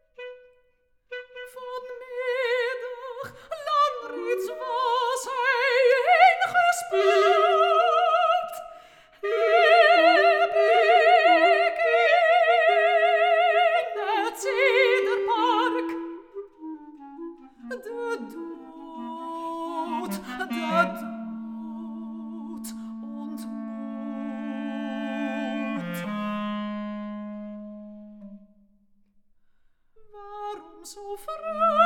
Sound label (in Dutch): Hoge stem en klarinet